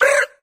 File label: brrrrrr, Voice